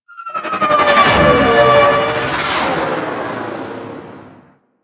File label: Fighter Ship Attack Maneuver, Engines